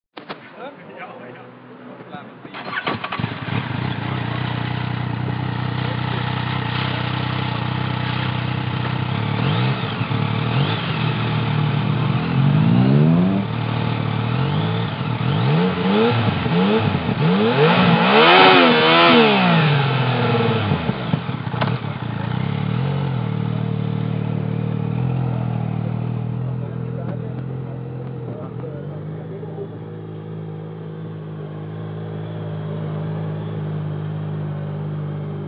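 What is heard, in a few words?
Yamaha MT-09, motorcycle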